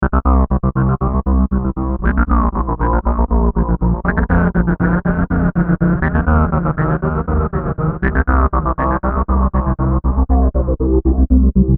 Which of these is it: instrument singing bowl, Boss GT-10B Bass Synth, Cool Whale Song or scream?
Boss GT-10B Bass Synth